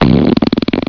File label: fart